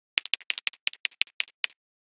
Message, Nokia Alerts; Windows Phone apps

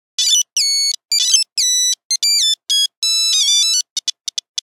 Nokia Buzzer; Windows Phone apps; 8310 City slicker

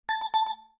Remind, notifications; Tinno; ATT Calypso

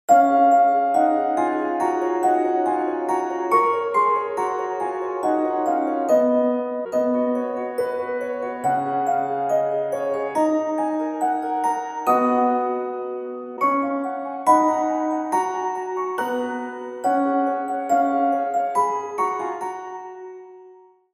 Relaxed, alarms; China Mobile A3s

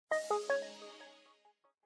Shut down; LG; KB775-Scarlet

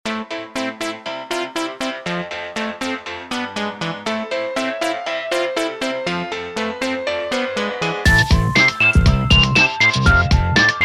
My Beat, ringtones; Bluboo; S8

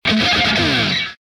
Alert Hard knocks Slide; Samsung SGH-T839 Sidekick 4G

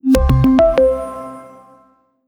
Power On; T-Mobile

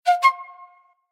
Flute, notifications; Infinix; XOS 10

